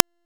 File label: Thermal Shock Ring; Warframe; Runner